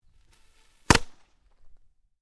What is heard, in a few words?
FIREWORKS CRACKER SMALL SINGLE METAL PIPE; Variety of fireworks being detonated